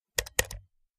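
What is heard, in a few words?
Low pitched Mouse Single, mouse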